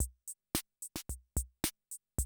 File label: CR78, DrumLoops